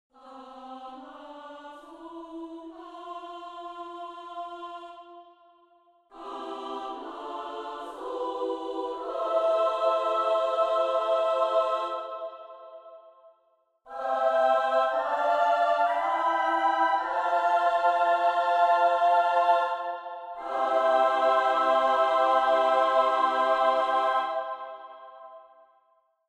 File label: Choir